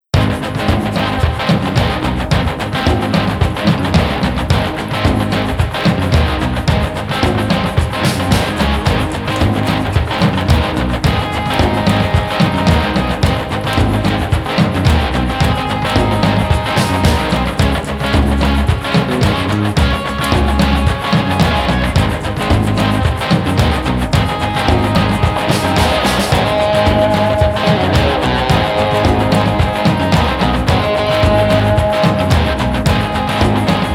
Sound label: Groove, Music that sounds like the 50s, 60s and 70s; Classic Rock with a gallop beat and psychedelic chords.